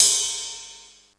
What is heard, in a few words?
RIDE, DRUMS; East West; Global Sounds